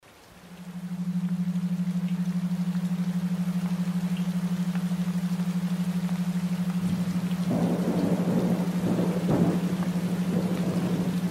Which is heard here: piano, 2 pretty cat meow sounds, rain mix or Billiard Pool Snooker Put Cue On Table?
rain mix